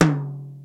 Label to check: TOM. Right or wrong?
Right